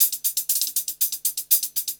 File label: 120 HIHAT